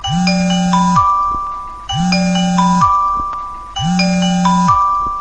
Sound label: Disaster escape information docomo